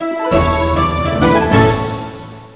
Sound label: ORCHEST